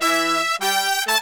100, BRASS SAMBA; Best Service ProSamples vol.14; AKAI

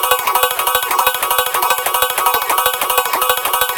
Cool Arp Thingy; random and out of place

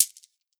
MIXED PERC; 90 s Sample CDs

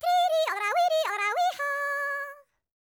YODEL, Vocal World Voices; ILIO; Vocal Planet

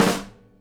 SNARE, Live Snares; E-MU Producer Series Vol. 8 – Platinum Phatt

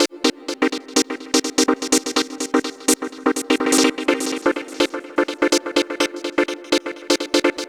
SYN 125, House synth loops

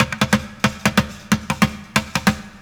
Street Groove, Ambient Grooves

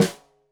Snare Stereo, Drums; Deeply Sampled Drums

